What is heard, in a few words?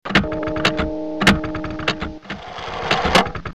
Clock; Myst